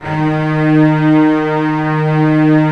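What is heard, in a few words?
Cello Legato D#2; Multisamples; Digital Sound Factory Virtuoso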